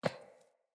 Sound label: Boot Walk Metal, Footsteps; Characters; Enemy; Boots; Sinecure; Melee Grunt